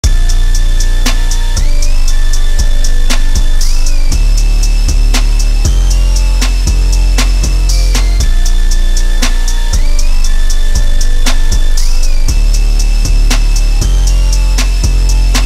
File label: slowed reverb